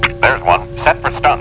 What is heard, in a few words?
pain; stormtrooper